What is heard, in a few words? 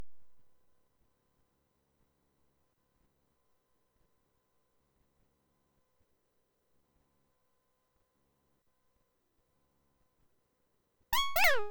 Button Click; lemmings ibm pc adlib 1991